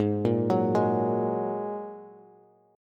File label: G sharp 7th Suspended 2nd G sharp Bass; G#7sus2 arpeggiated; JGuitar